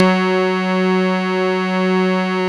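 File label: synth, MicroWave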